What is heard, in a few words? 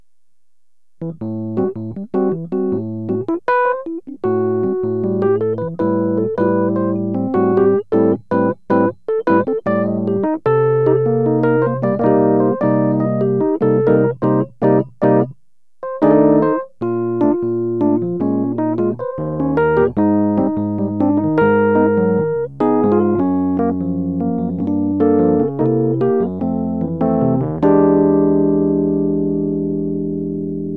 Parallel Tine Bomb